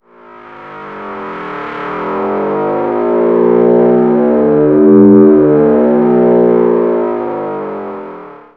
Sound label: pad; synth